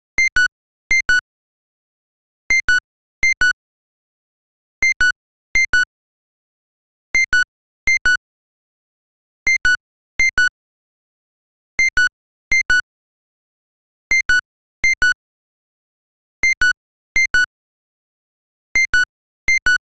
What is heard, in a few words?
Alarm; LG; Escape-P870